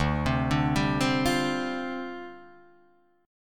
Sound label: D Minor 11th D down Guitar Drop D